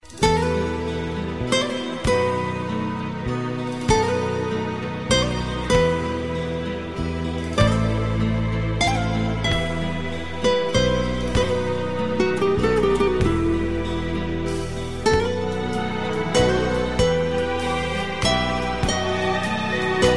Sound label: light guitar